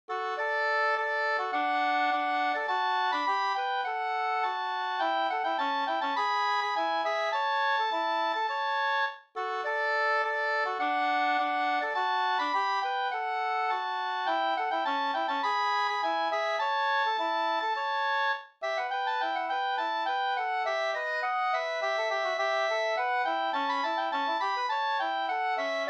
ganglat D dur

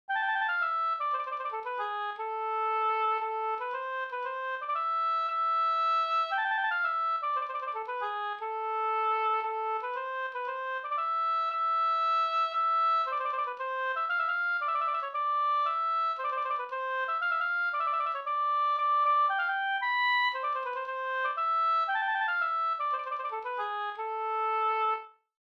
polska Bodapolska

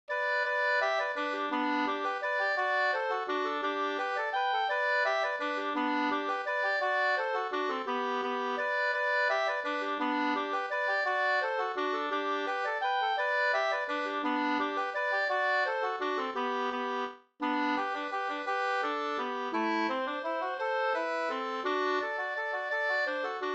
vals brudvals

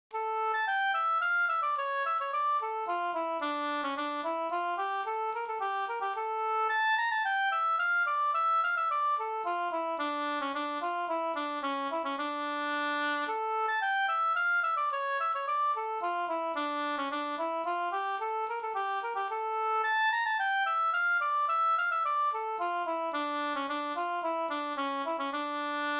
polska senpolska; Fabodpolska; Carl Pettersson Njurunda Mp

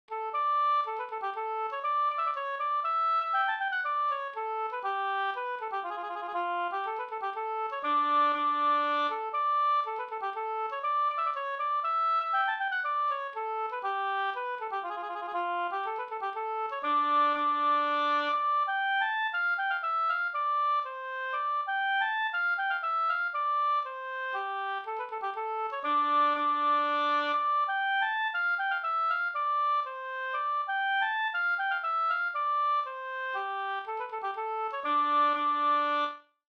polska Bingsjopolska moll; Gladlaten

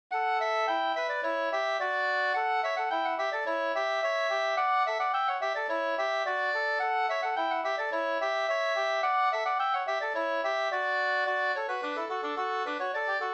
engelska

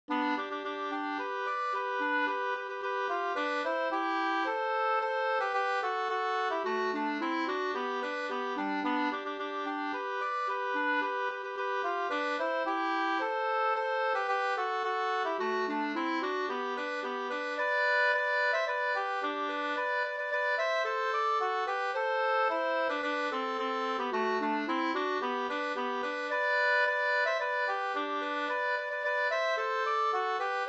polka

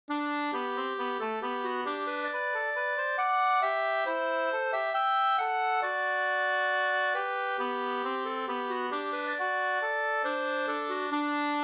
brudmarsch; Skara brudmarsch; Lagmansboda brudmarsch av Owe Green

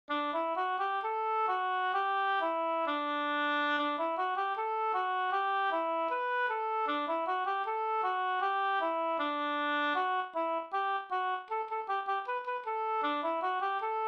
folkdans; Flamlandsk sevensprong

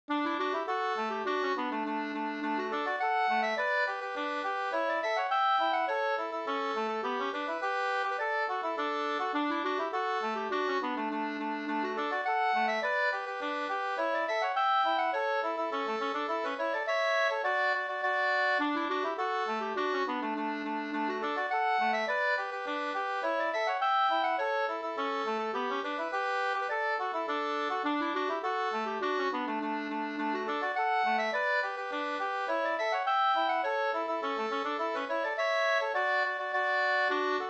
polka polkett; e Jon Petter Liv Stode Mp 2st

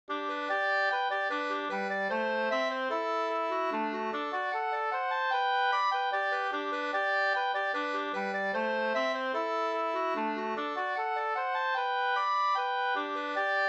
schottis 4-4; e Karl Styfberg Up 2st

